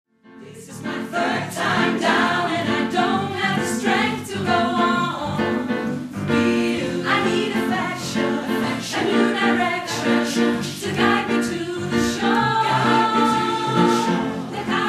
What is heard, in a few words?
ssatb